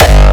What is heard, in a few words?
hardcorestyle kick attempt